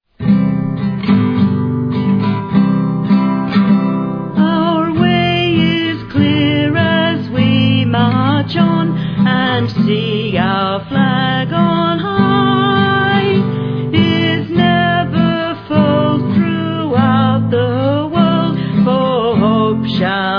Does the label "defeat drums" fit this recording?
No